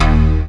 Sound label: SYN Suspicious; Best Service Gigapack 1